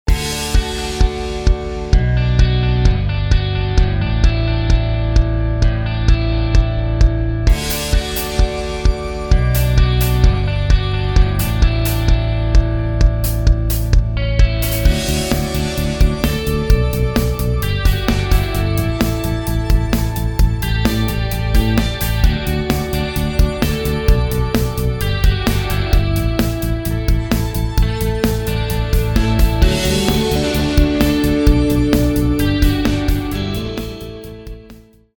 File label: Inspiring Anthem